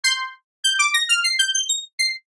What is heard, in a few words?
rev glass; reverse